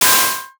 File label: water splash small